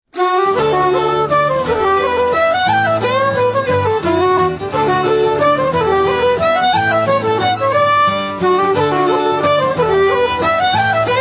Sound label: Celtic Music - Mazurkas; Tommy's Mazurkas